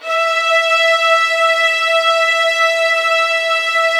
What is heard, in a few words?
E5, soft violins; Q Up Arts; Denny Jaeger Master Violin Library